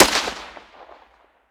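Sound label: weapon ar2 suppressed fire player atmo exterior; gmod; viper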